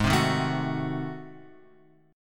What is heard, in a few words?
G sharp 7th Flat 9th G sharp, chord; G#7b9 Chord {4 3 1 2 X 2} strummed